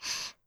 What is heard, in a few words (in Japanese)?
ブレスミディアム